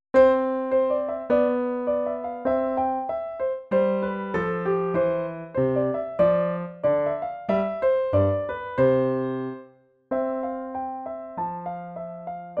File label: sonatina in c